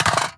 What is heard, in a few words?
mag drop, weapons; cstrike; valorant; rgx 11z pro vandal